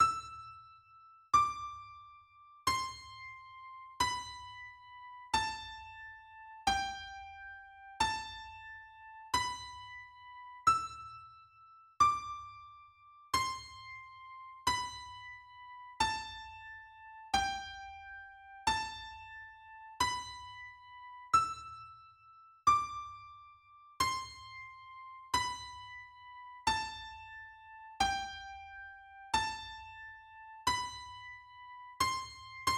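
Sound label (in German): Canon in D Solo E-Gitarre; Pachelbel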